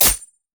crit attack; LoL; akali

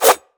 crit attack; Zed

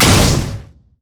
crit; LoL; lucian

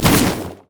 blaze; LoL; brand zombie

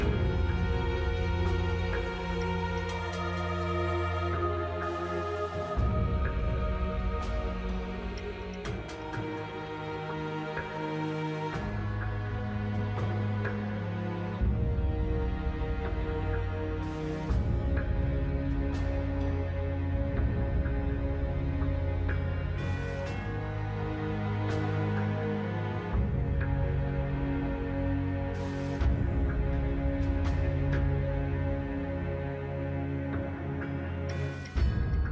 defeat loop; crystalscar; lol

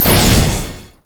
w oh initial; Vel'Koz

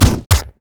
bear basicattack; LoL; annie base

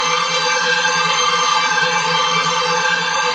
stair laser loop; odin

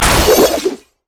bola strike; LoL; hunter rengar

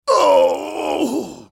dying; Gragas; Character Voices - English

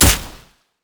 axe throw; olaf

